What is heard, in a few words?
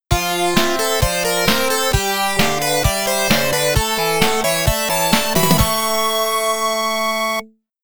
Return In Triumph (Stage Clear); R-Type; PC-8801